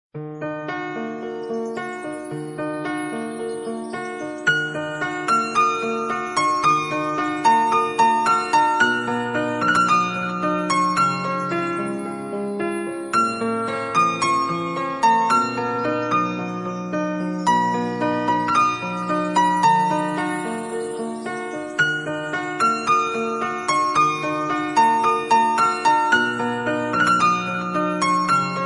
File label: Very Sad Love Instrumental Ringtone Romantic Piano